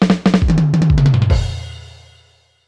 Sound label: Drum Fill, Yamaha DD-35